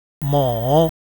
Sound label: MOO